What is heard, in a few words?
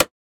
perc